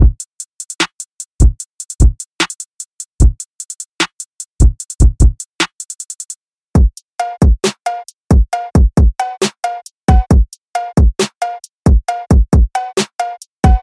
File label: Drum; Unison Famous Beatmaker Drum Essentials